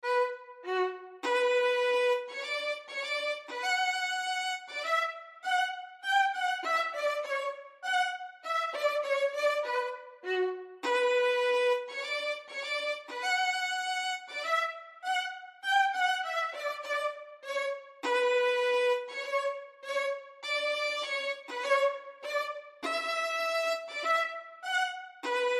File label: L'Arlesienne Prelude violin duet